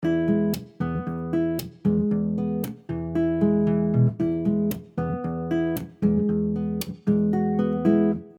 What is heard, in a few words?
Percussive Emaj 115bpm, Soft Nylon Guitar; Percussive Patterns; MG Soft Nylon Guitar - MIDI Pack